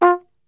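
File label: F2, flugelhorn, brass; Best Service; Brass Super Section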